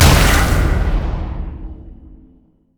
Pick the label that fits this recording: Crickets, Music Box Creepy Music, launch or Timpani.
launch